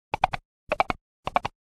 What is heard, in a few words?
step, mounted movement